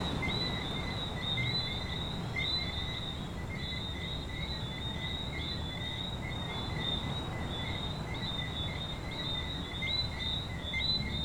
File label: frogs Coqui playa